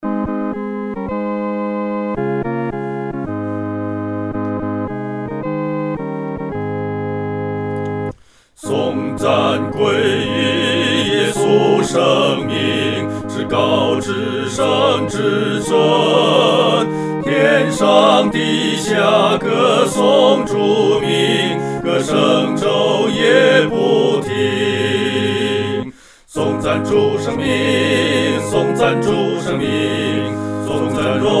合唱 四声部; 颂赞主圣名

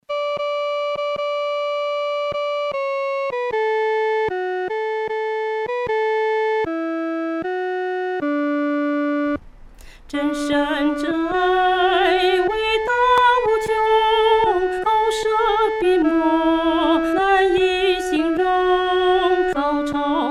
独唱 第一声

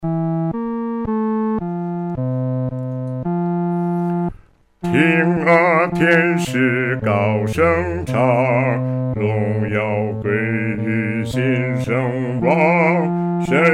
独唱 第四声; 听啊，天使高声唱

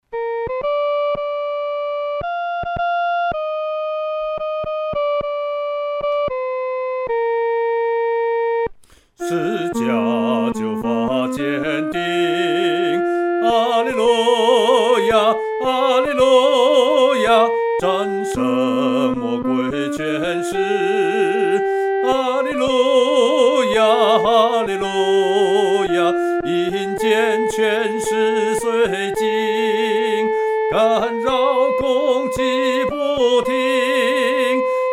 独唱（第一声）

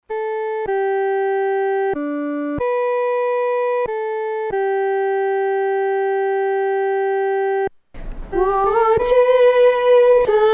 独唱 第一声

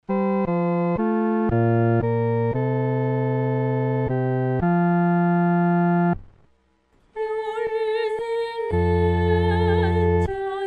独唱 第四声

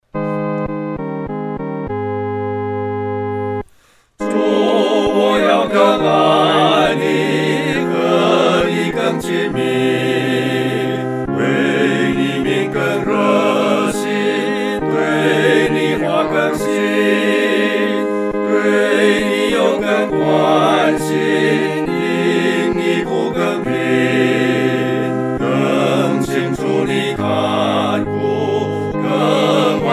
合唱 四声部; 主！我要更爱袮

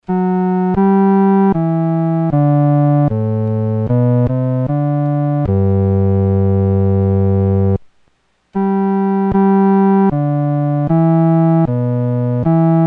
独奏 第四声; Doxology; Thomas Ken, 1757

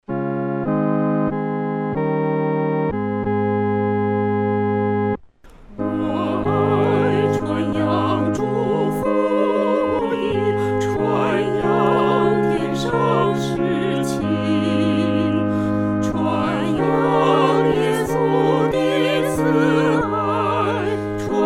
我爱传扬主福音 合唱（四声部）

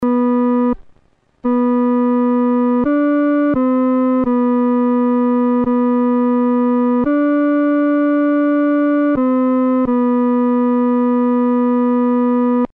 阿门颂 独奏（第二声）; 阿门颂（一）

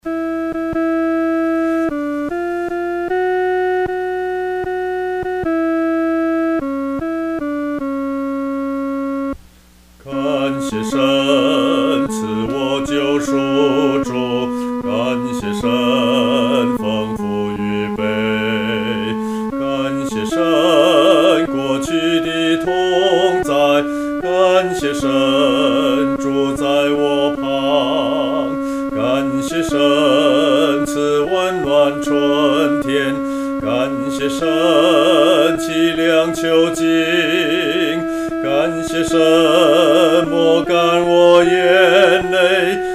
独唱 第二声

